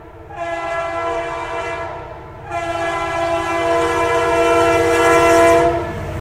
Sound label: whistle